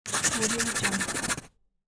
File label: pencil writing on paper fast